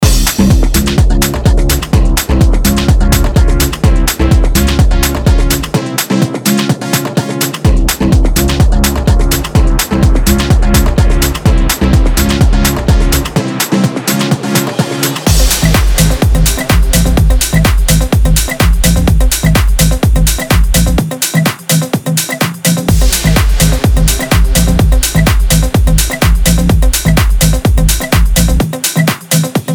Serum Presets; Toolroom; The Sound Of Toolroom; Serum Presets Vol. 3

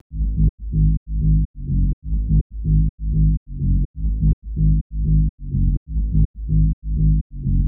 BASS LOOP SIDECHAIN

